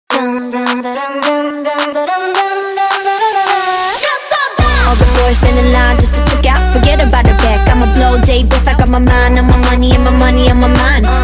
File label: whistle remix ringtone